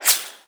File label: carbine whizby